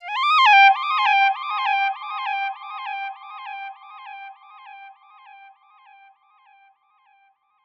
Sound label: ACCENT 100 BPM C Min; Ear Candy; Leader; Cymatics; ANALOG EVOLUTION Beta